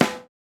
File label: snare samples, Rock